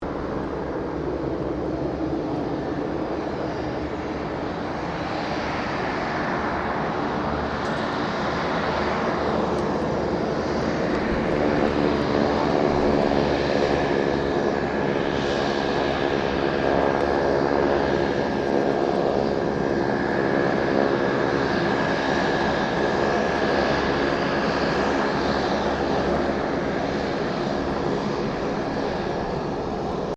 AIRCRAFT 2007 KING AIR 350 IDLE; Airplanes; Vehicles

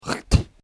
Quick Spit Spitting; Spitting Sound Effects; human sound effect

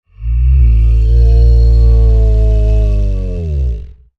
Creature Large Moan Rumble w Breath; Monsters; Horror; Big Room Sound

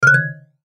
Logo Marimba Chromatic Run; Blastwave

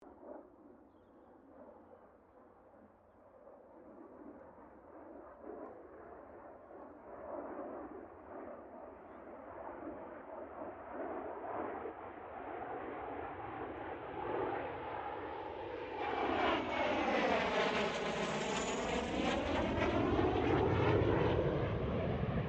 AIRCRAFT CA-27 SABRE PASS STEREO

